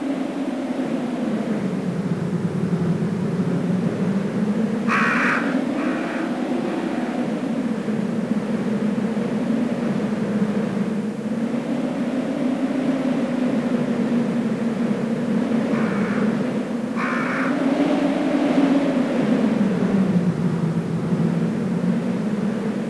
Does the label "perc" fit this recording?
No